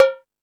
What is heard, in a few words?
Hip House, drum machine